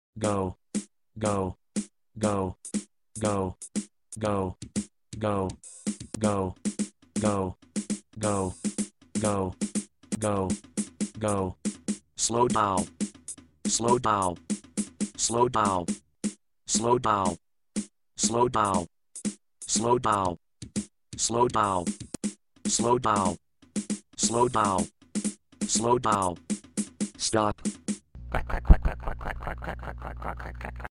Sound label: Street Beat